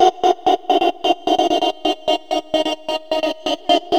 130 bpm

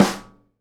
SNARE RIM, Live Snares

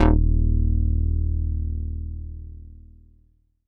Pulseboy d#2, Korg Poly-800

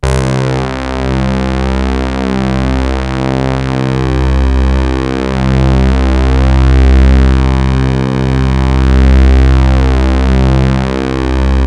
SYNTHLE, MORPHEUS

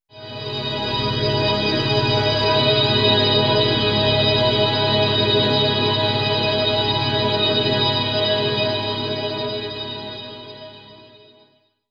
Hi Mid Complex, Complex Wave; Sonic Foundry; Sony Creative Software; James Johnson Spektral Minimalism

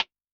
JNGL SN, Snare; Yamaha DTXpress-II

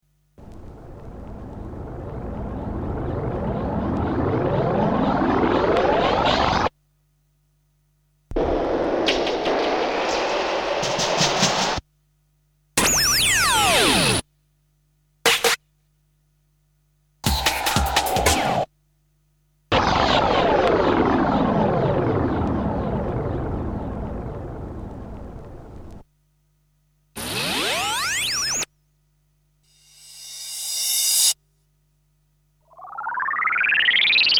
Sound Effects; Kniteforce; 1000 Samples Volume 1

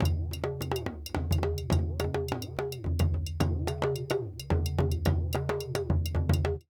Djoun, World Percussion

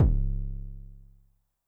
BD MOD Overdrive Low, bass drum, 909; classic machines; 909mod

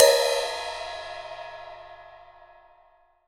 CYMBAL REGAT

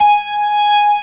organ G#5, Organ B3 Hammond; AKAI S-1000

